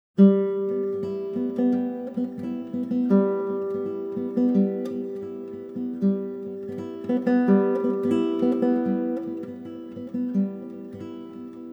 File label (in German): Stille Nacht, Gitarrenmusik zu Weihnachten, Klassische Gitarre